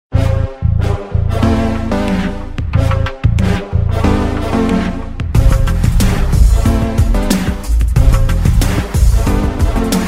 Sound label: rocking tune ringtone